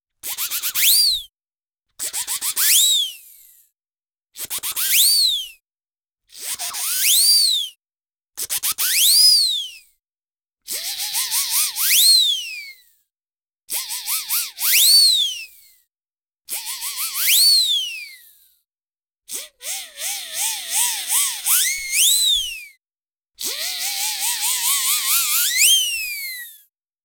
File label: CARTOON - whistle, multiple, zip up very high and down; Game Audio Factory; Cartoon Materials